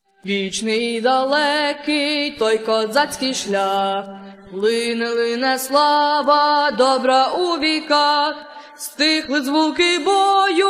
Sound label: ukrainian songs vocals